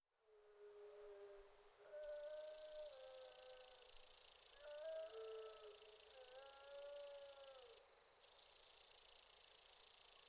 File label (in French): ambiance nocturne criquets chien